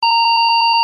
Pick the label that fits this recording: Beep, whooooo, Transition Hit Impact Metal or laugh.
Beep